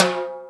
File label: Cowbell Timbale; Proteus 2000; Digital Sound Factory Proteus 2000